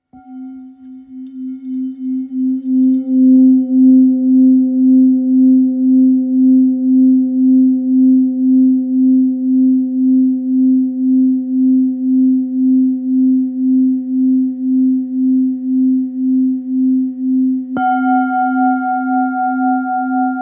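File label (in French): Bol thick si, bol chantant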